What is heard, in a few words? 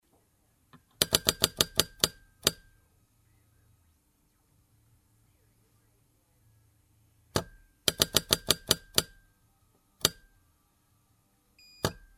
clicks; fieldrecs